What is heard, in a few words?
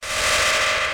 Teleportation